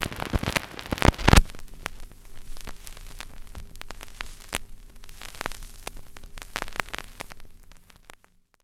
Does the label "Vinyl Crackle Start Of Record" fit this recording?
Yes